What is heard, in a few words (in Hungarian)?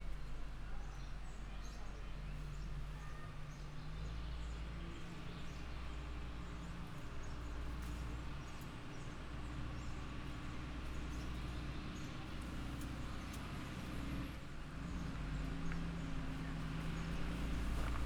gyengeje a szippantos auto, csoka; állathangok; miskolci zoo 2018